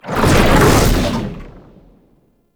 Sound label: Perfect Heavy Attack Activate, Melee